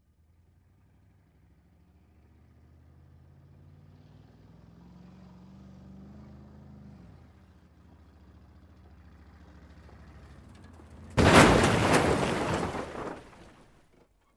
Approaching Car Crash

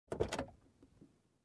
Compartment rattling inside car

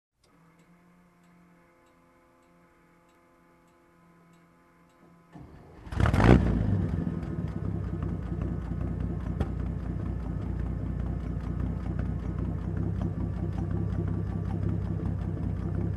Tank engine idle

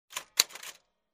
Typewriter Click

